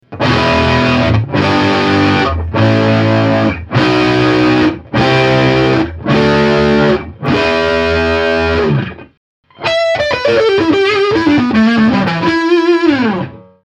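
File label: alumin neck drive, strat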